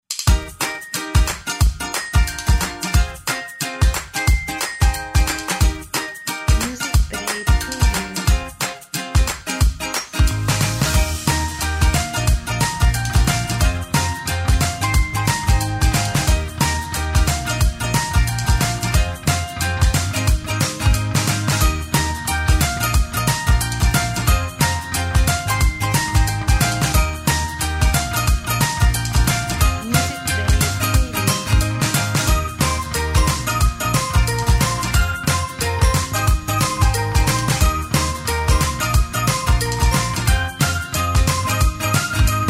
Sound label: Happy Advertising Corporate